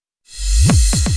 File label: Fills 128BPM, Clubsounds